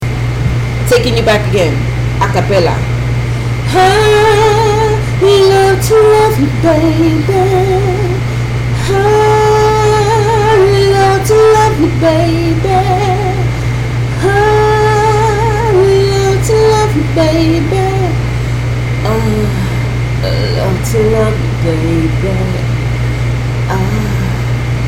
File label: acapella and the different tones in my vocal cords